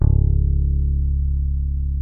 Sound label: P BASS SUSTN, C#1; Sampleheads; Will Lee Bass Library